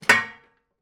household bathroom pedal bin open lid hit wall behind; zapsplat